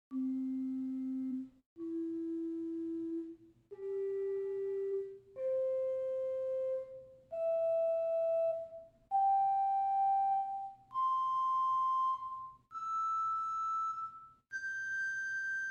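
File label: Great Waldfloete 2 arpeggio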